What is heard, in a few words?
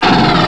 Hard Rapid Fire; Swoop 1.0.0; Ambrosia Software